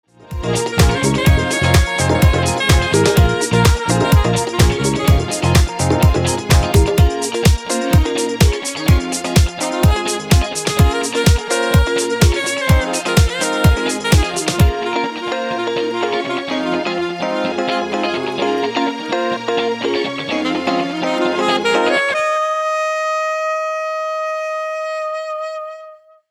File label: DJ Combo with sax